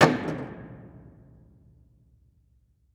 Crash Wood Board On Hollow Metal Container With Resonance; Crashes